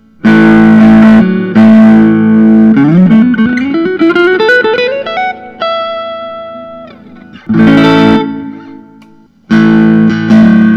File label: suhr standard custom bengal burst